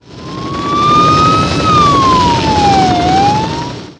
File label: helo; kabloey redux